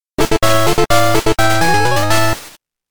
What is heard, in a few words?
Fanfare! Card Flip; Pokemon OST Collection; Pokemon Gold Silver Crystal OST